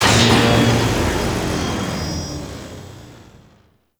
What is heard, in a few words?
Gas City Power Down; Warframe; Corpus Gas City; Gameplay Remaster; Ambience